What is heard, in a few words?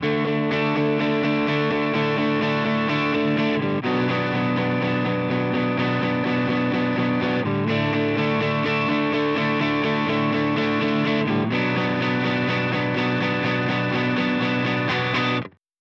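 guitar tone eq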